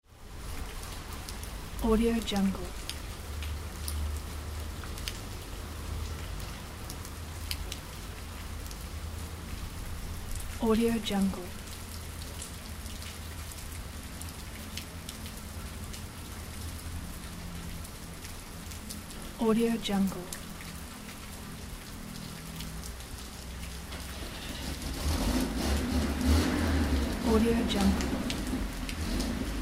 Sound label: rain and idle noise of a car on the street